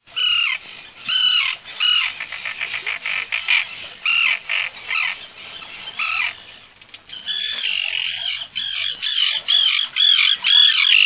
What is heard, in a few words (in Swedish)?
fisktarna